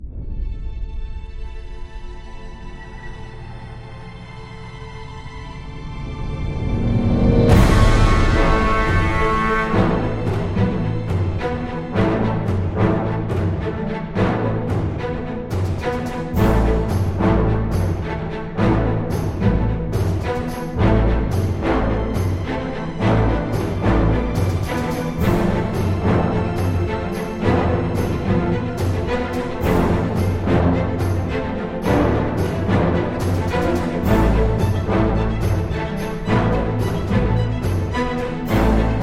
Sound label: intro music; Recode; rampage riches